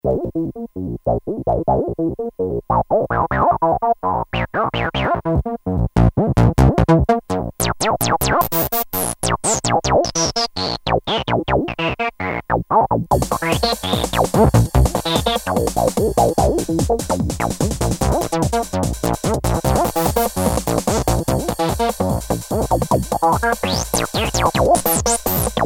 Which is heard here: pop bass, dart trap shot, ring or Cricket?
ring